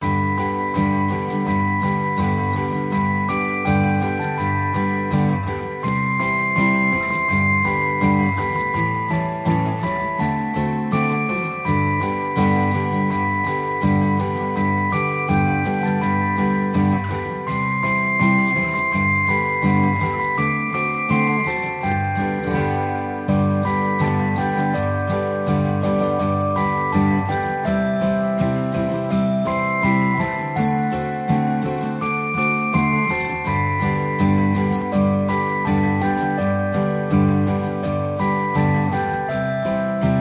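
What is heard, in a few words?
Christmas Loop, ringtone; MIUI14 and HyperOS 1.0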